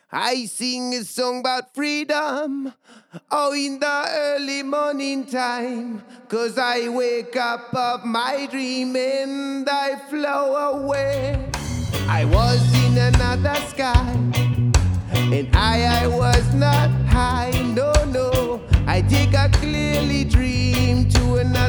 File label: Exponential Audio R2 Vocal Hall